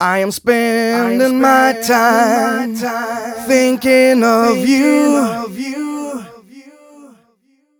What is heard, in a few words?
130 mel g, Vocals